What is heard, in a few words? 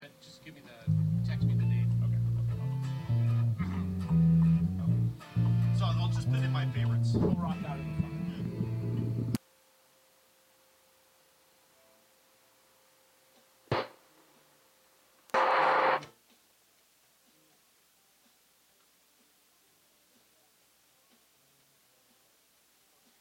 Background Noise Mix